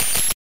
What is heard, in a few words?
Soft white noise glitch